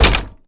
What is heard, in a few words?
sit chair; scripted sequences, a1, safehouse